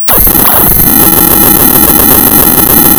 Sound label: pop, tearing paper